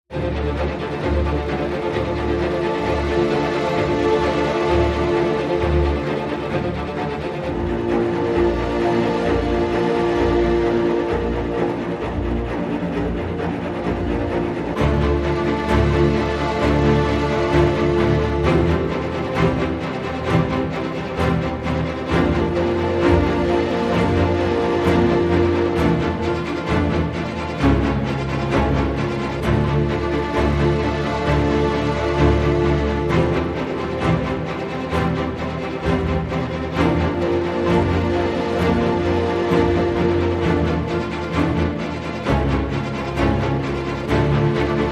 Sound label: Strings Tension